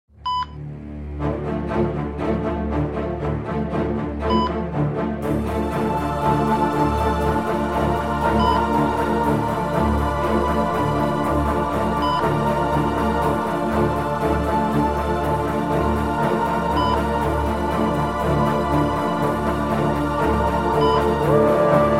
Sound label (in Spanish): corto misterioso inicio